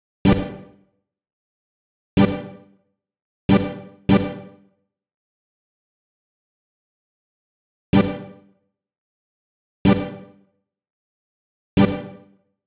125 G Synth, Complex Electro Synths; Prime Loops; Explorations

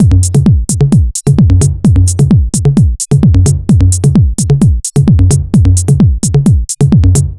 130 BPM, Digital Breaks; loops; Loopmasters; Jon Carter & Alex Blanco; Block Party Essentials

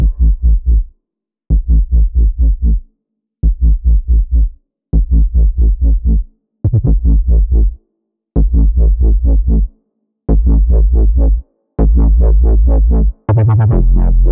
Bpm140 Loss Bass Loop Am, Dubstep; Prime Loops; Dubstep Illusions

